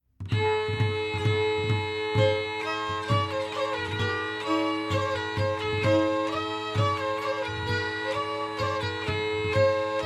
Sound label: Tavern; Assassin's Creed Unity